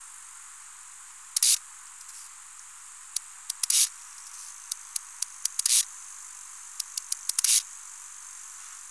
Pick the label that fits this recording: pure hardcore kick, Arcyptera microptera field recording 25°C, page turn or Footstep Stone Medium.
Arcyptera microptera field recording 25°C